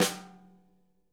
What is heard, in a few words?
SNARE; drum